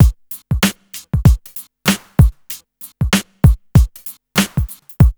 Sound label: RNB 96 BEAT, Hip Hop; USB Soundscan vol.34; Burning Grunge; AKAI